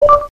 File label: confirmation beep; Google Assistant